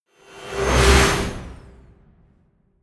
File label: flyby